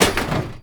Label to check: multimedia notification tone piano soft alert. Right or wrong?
Wrong